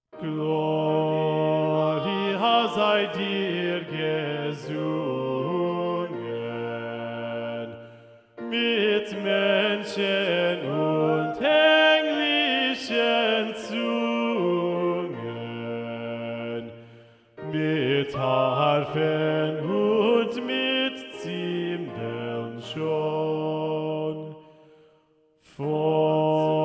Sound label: SATB Bass Predominant; Cantata 140 - Bach; Gloria sei dir gesungen (Wachet auf, BWV 140); J.S. Bach